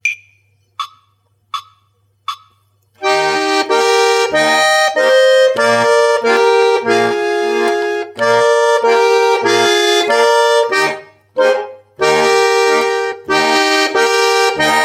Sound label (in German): polka